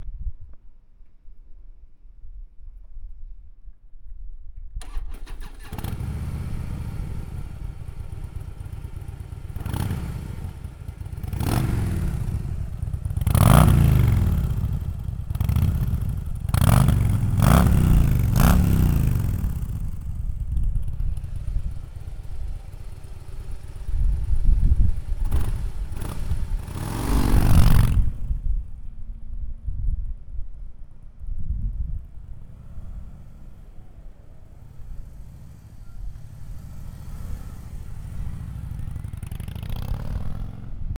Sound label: Harley Slim S